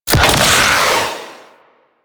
Space Fighter Weapon Fire; Warframe; Railjack; Grineer; Space Fighter Dredger Agent

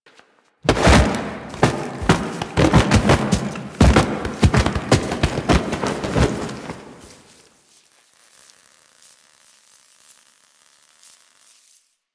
Foley Rolling; Kahl; Warframe; The New War; Grineer Eidolon Shotgun

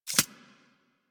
Arachnoid Micro Step; Warframe; Corpus

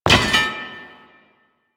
Debris Metal Stone; Warframe; Ambience; Entrati

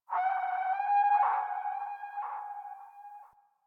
Venus Landscape Distant Robot Call Low; Ambience; Warframe; Venus Open World

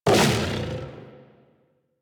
Kubrow Armored Vocal Attack Short, Enemies; Warframe

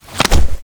Glyph Game Push Foley; Drifter; Duviri; Glyph Puzzle

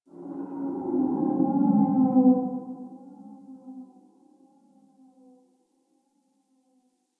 Exterior Distant Calls, Ambience

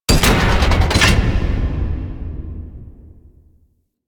Fortress Large Door Close; Ambience; Warframe; Grineer Fortress

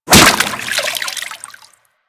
Infested Whip Impact Water

